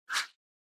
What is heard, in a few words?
Motion Swish High Single